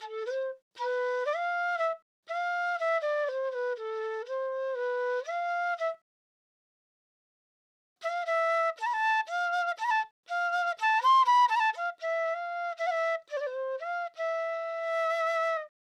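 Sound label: Hip Hop Flute D minor 120 BPM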